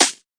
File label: tight hit, Tight n Hard Kit; Hip Hop; style kits